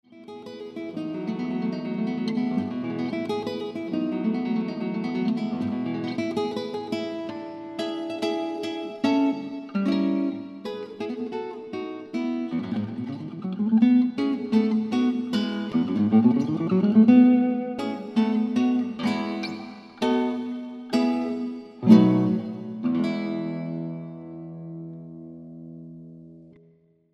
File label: flute sample